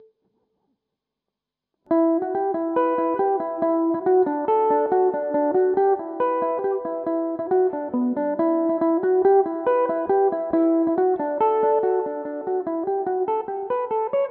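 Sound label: E dorian, irish mandolin, tunes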